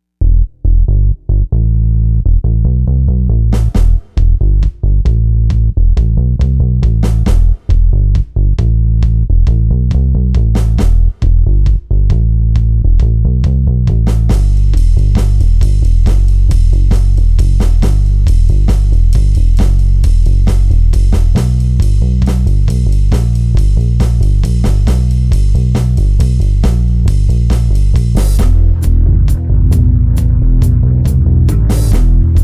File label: Bassic Instrument